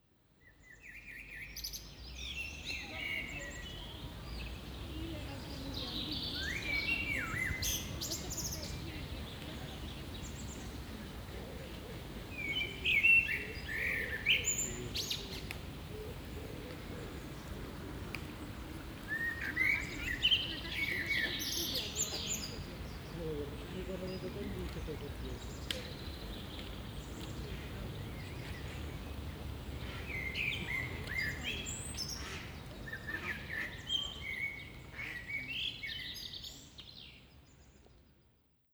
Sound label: Pure Binaural Recording - Birds Binaural Munich English Garden Sound